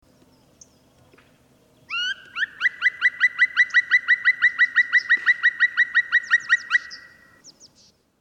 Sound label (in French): chant pic noir